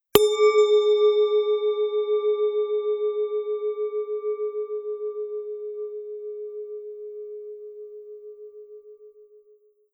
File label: Goblet Chime